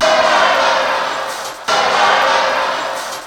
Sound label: MACHINES